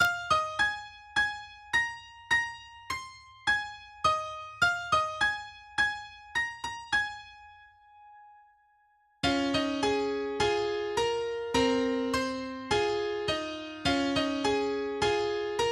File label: piano solo; Clannad